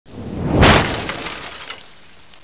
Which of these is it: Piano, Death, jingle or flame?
flame